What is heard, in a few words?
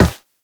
Operator Run Metal, Footsteps; Warframe